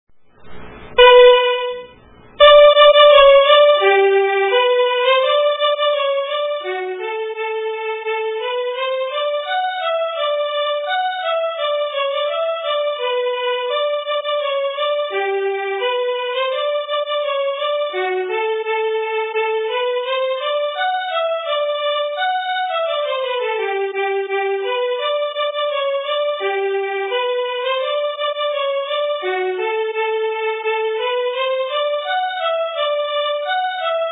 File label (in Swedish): polkan